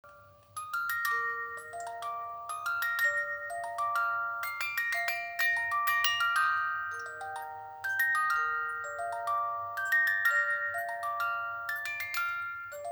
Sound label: slaap pop melody